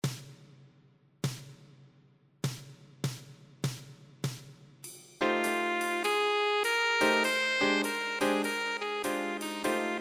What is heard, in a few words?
PLAYALONG BASS